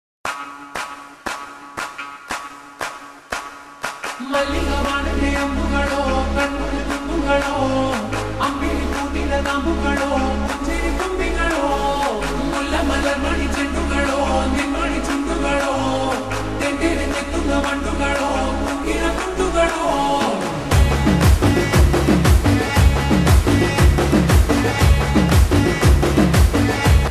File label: Slow Reverb Version Ringtone; Pushpa 2